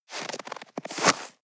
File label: step snow scuff